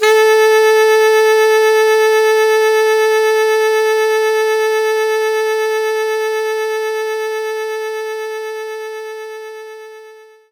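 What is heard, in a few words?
Soprano Sax G#1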